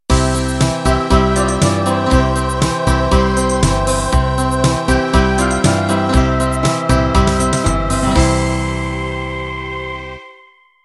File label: Schlager End